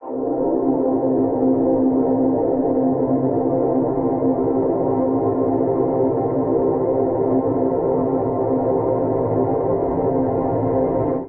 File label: Drone Instruments; Megarachne